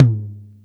Tom Mid H; BJA Pacific

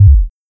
Kick; Samsterbirdies; pitchglitch samples

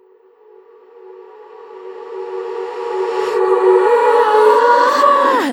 Riser Vocal D sharp

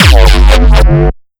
Kick Dist w Synth; Granular Sumo

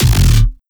Kick Dist Boss Mode

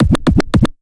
Sound FX Scratch In

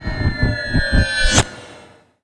Sound FX Scifi Organic; Audiopapkin

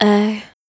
Vocal "Eyh"

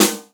Snare Soft

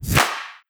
Clap Trap; pitchglitch

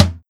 Tom Mid

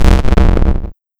Noise Bass SFXR

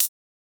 Open hat

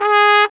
Trumpet G; pitchglitch

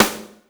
Snare Soft

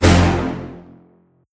Brass Stab; pitchglitch

